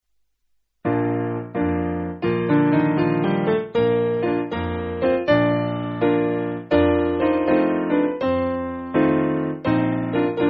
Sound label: Gabriel, Piano; Simple Piano; God Is Calling